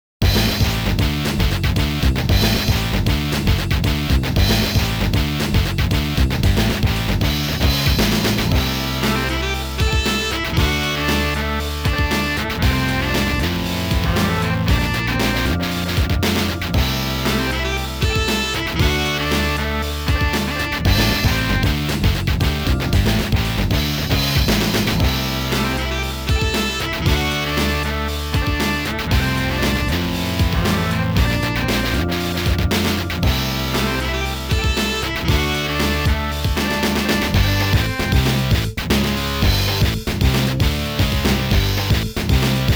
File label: Stormy Saxophone; snk characters sounds collection volume 11 iori yagami; KoF 98 OST